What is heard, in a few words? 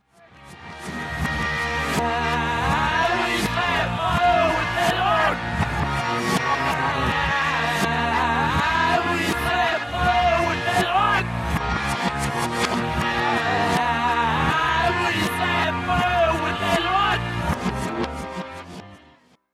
reverse, rock; backmasking contrario